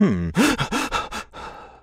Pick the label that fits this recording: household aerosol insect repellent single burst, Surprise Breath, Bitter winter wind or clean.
Surprise Breath